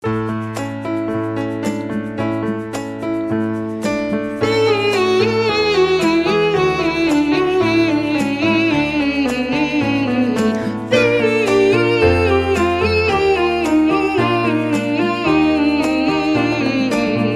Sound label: Falling Backing